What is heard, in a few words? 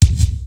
REVERSE DRUM; Rubber Chicken Software Sample Bank; DRUMS + PERC